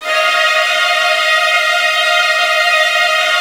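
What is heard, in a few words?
Virtuoso Strings, violin trills, D#2; ILIO; Kirk Hunter Virtuoso Strings VOL-1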